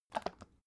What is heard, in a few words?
bottle syrup upend; berufe; gastronomie